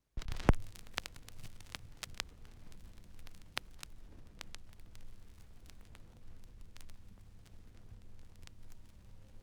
Turntablism; Sonokinetic - The Roots Drumloops

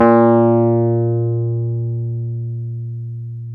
PNO Wurly; Roland LCDP10 Keys of the 60s and 70s 2; Wurly 200B

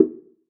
perc organic dumb

